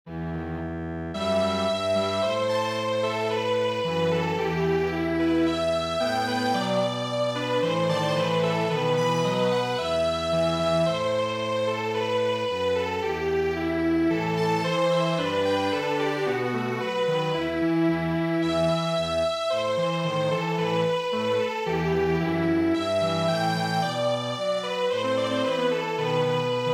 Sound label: Strings Quartet, traditional